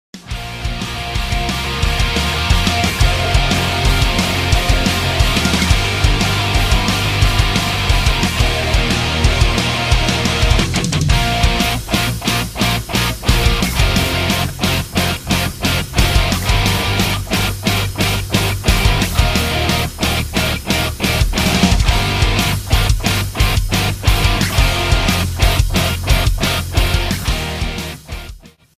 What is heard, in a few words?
Ringtone